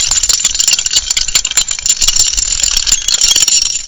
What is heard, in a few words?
gragger